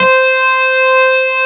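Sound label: Wurlitzer C4 - R; USB Soundscan vol.09; AKAI; Keyboards Old School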